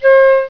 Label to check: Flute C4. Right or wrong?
Right